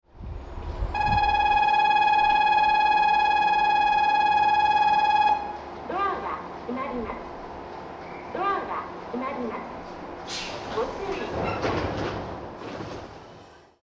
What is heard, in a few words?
bell, osakaloop; fukushima; jrwest